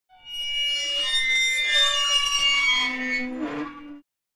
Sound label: Metal squeaking from movement